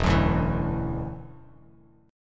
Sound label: E 7th Suspended 2nd, down, Bass Standard; E7sus2; strummed